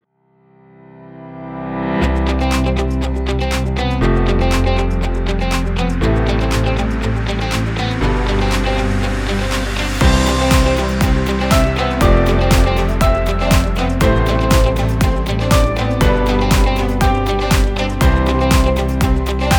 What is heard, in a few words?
Corporate Business Logo